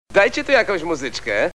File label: muzyczka ringtone